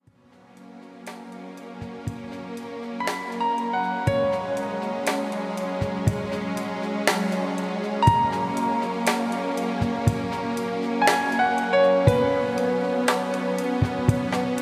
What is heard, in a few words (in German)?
Entspannungsmusik mit Klavier